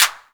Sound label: sfx perc